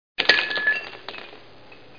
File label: glass rolling